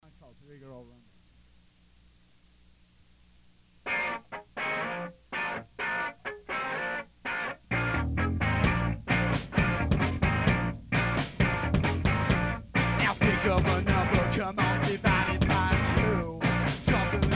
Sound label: Rhumba